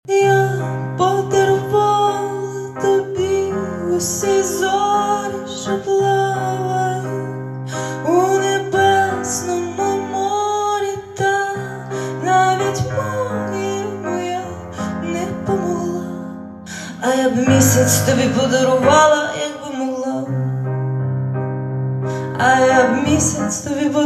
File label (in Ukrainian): fortepiano